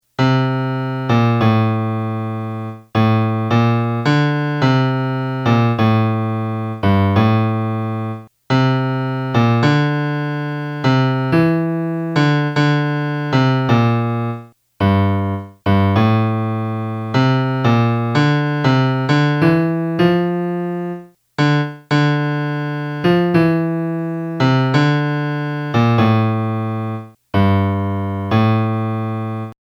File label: melody